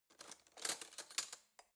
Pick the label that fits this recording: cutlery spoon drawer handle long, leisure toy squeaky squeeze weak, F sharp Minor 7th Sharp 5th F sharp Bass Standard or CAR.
cutlery spoon drawer handle long